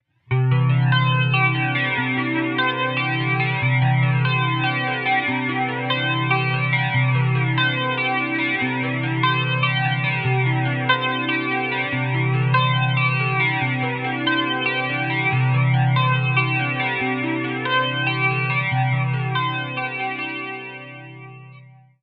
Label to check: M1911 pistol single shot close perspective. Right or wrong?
Wrong